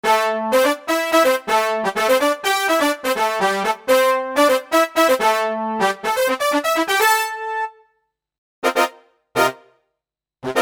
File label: LIVE Brass Section; live instruments